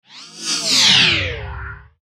Whoosh Power Surge Flange; Blastwave

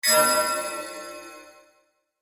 Solace Chime Of The Vampire, scary soundscape; Horror Sound Effect

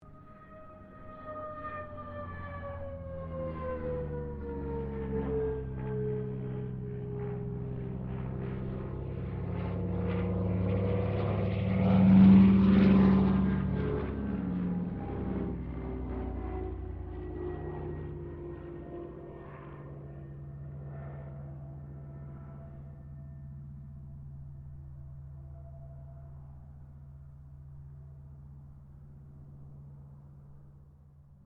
AIRCRAFT CA-13 BOOMERANG PASS STEREO

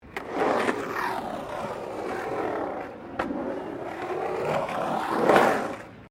SKATEBOARD SKATE BOWL THROUGH RETURN; Skateboard sounds; Skateboarding Sound Effects; Skateboarding (Sound Effects:Sports)

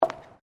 FOOTSTEPS WOMENS LOW HEELS BOARDWALK STEP

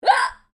FEMALE HICCUP; Female coughing and hiccups; Hiccupping; Human

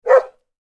animals dog bark med large; Dog Bark (Medium Large); Dogs; McKinney Sound

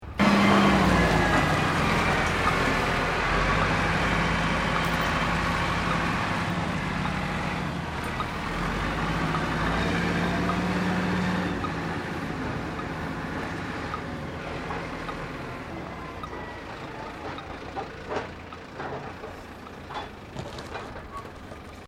Tractor Earthmover Maneauvering Loaded; Tractors; Vehicles

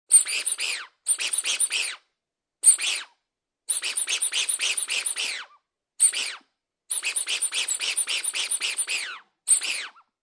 Toy Dog Toy Squeaks Many

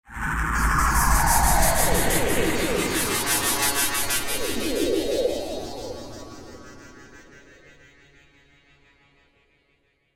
Another Ufo, science fiction sound effect; star wars / trek style games

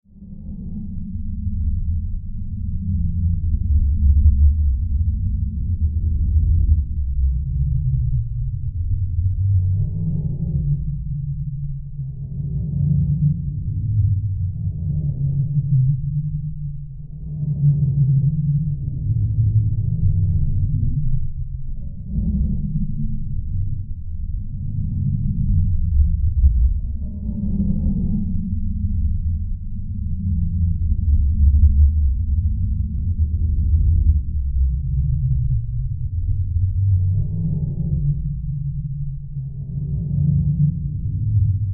Drone Dark Rumble Low Pulse; Blastwave

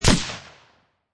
Machine Gun Heckler and Koch MP540 Single Shot

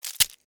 Scorpion Tail Snap, Stinger, Attack; Animal; Arachnid, Insect, Bug; Scorpion Sound Effects

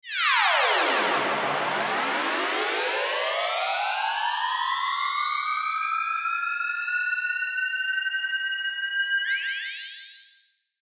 Spacey Descend Ascend, Cartoon

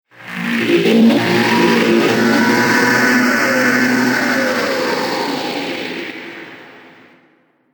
Ultimate Spaceship Engine Noise; Spacecraft Sound Effects; Sci-Fi Sound Effect; Andrew Fleming

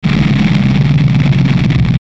Distortion Grainy Rumble; Blastwave

